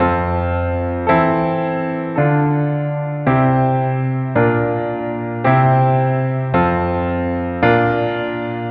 Piano, Trip-Hop Loops; Sonic Foundry (Sony Creative Software) - Rondo Brothers Trip-Hop Loops & Samples; Apologists

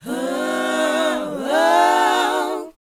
BOYZ C#, Vocal Planet R&B & Dance; ILIO

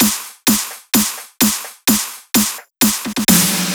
Fills 128BPM, Clubsounds

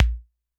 perc, Drum Machines, Clavia Nord-Drum; 300 Drum Machines

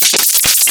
Closed Hihats; Sliced

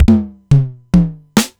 Simmons SDS1000; SDS1000 Factory5

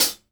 SHORT, kits; Sampleheads; New York City Drumworks VOL-1

